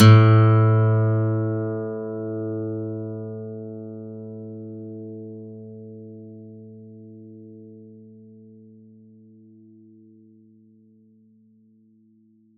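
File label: plucked steel string A2; Polyend; Simulacrum Sounds; 1 Shots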